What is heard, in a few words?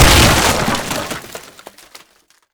break wood crate; physics; breaking